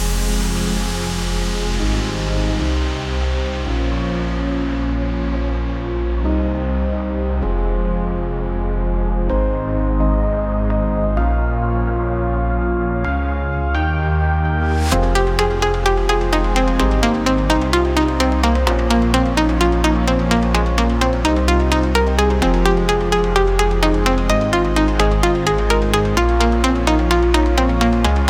Noise Tone; Arena

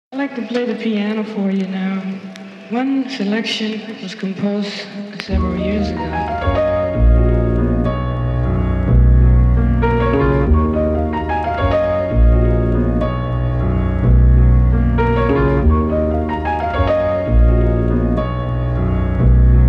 Piano Beat

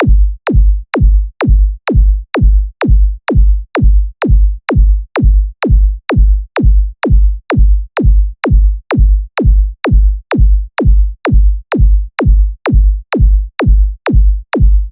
Beat Wooden Floor 128 bpm

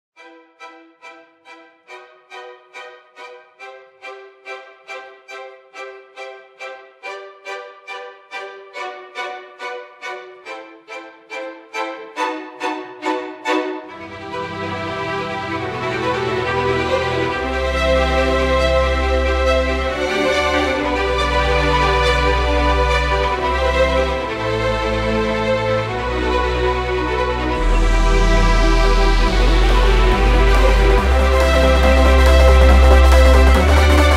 Classical Electro

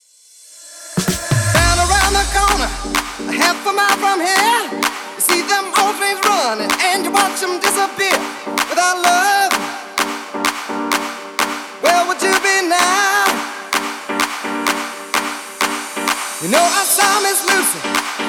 SAX

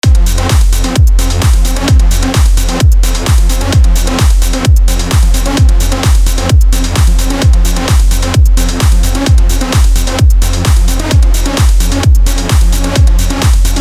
Trance; Trance Engine Project

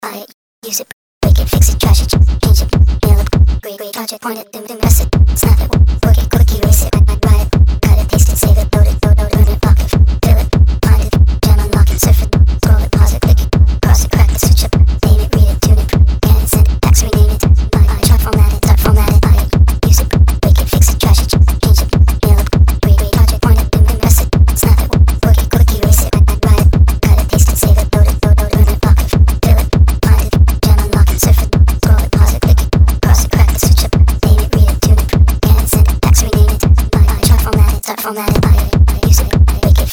Makina Techno raw loops

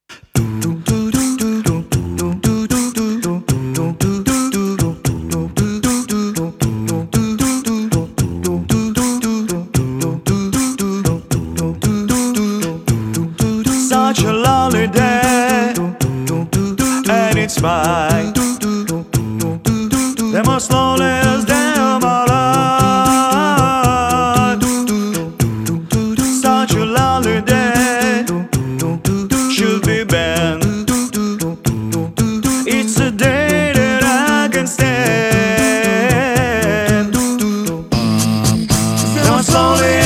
Acapella Cover

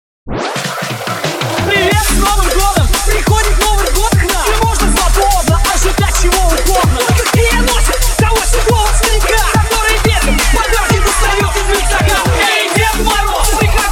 DNB

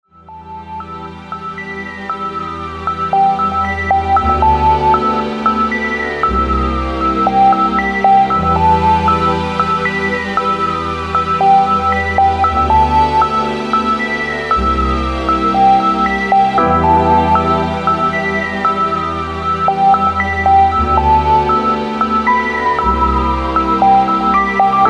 Ringtone

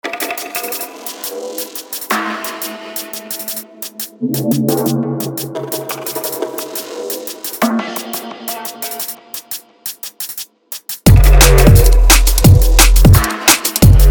fusion

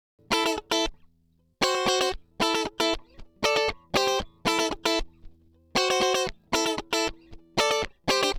guitar samples Am6 funk loop bpm116

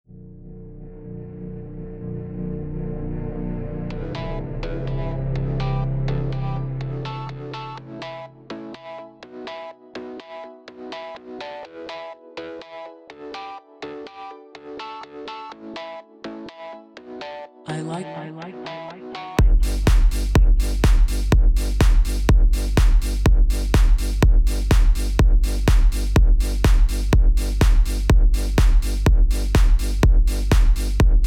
techno mix

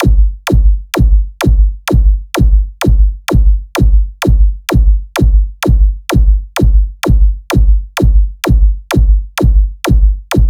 Beat Starter 128 bpm

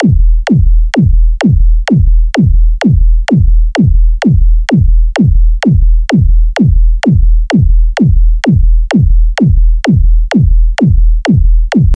Beat Gallop Horse 128 bpm

